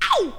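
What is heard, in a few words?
voice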